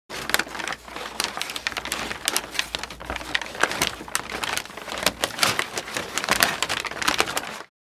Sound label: Loop paper handling; gfxsounds